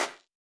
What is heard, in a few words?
snare, snare house